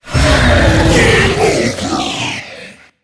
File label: Death